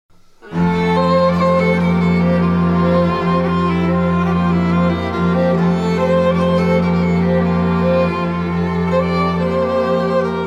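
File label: strings, String Quartet, Trio or Duo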